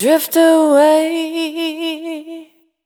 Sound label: Drift Away, Single Voice Melodic Loops; Singles